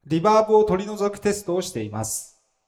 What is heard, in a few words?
Reverb processed